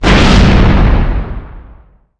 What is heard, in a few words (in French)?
explosion; impact